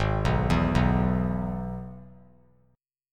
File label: Gsharp Minor Sharp 5th; G#m#5 arpeggiated; JGuitar